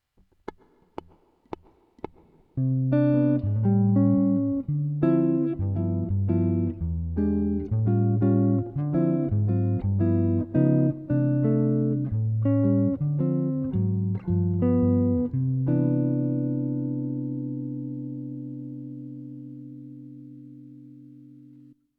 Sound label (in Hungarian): jazz improvizacio alap, gitár